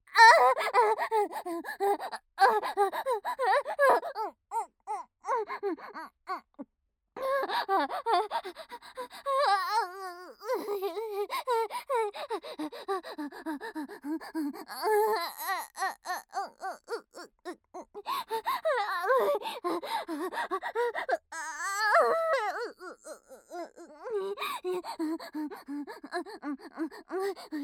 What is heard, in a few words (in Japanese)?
激しい喘ぎ, ASMR